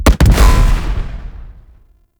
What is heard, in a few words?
Rifle Projectile Explosion; Weapons; Warframe; Corpus; Ruban